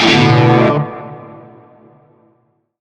Shawzin Maj Chord; Warframe; Narmer Shawzin

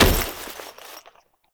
Foley Weapon Fire; Warframe; Characters; Kahl

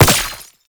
Gunbrella Bullet Deflect; Koumei

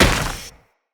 Moa Combined Step Dirt, Footsteps

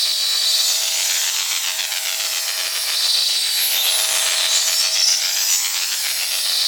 holo globe loop; Ambience; Props; Warframe; TheNewWar; Zariman

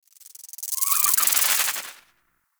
Tree Critter; Ambience; Props; Warframe; TheNewWar; Zariman

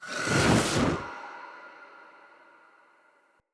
Fire Push Cast; Powers; Fenn

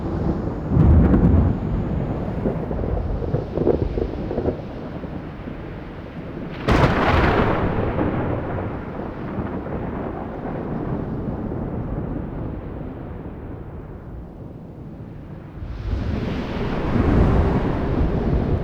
Lightning Storm Loop; Warframe; Ambience; Entrati

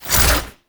Whoosh, weapons; Gunsen Prime

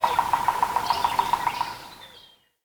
Tree Pine Pecker; Trees; Midrath Island; Ambience

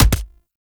Pistol Punch; Warframe; Weapons; Tenno; Lasrian

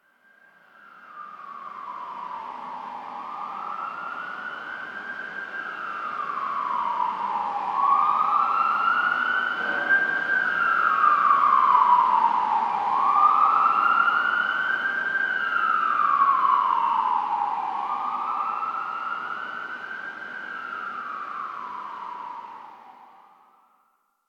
Exterior Distant Ambient Siren D; Warframe; Ambience; NineteenNinetyNine

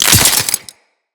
Head Shot Metal, Bullet Impacts; Weapons

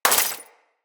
Bullet Impact Debris Glass; Weapons

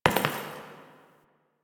idle single step; Tagfer

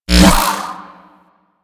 Kick Punch Whoosh; Warframe; Weapons; Corpus

